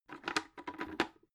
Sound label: bucket handle lift up